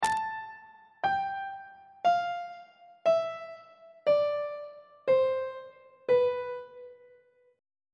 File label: locrian mode descending on treble clef